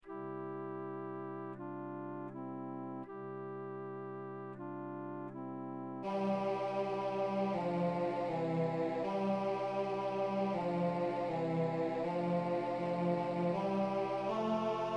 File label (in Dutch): messe tenor; gounod